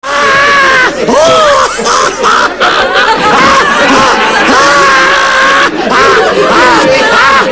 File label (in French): rire; foldingue